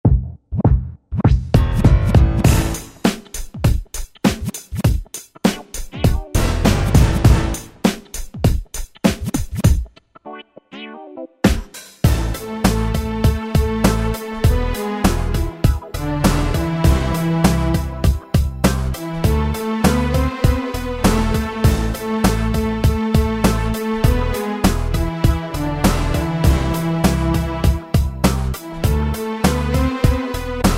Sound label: Yamaha PSR-3000 Music Finder Styles